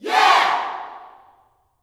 "Yeah", Vocal Gospel yell shout; Spectrasonics; Vocal Planet Vol.1 Gospel; akai

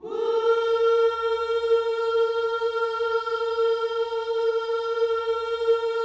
WHOO A#4, Vocal Gospel; Spectrasonics; Vocal Planet Vol.1 Gospel; akai

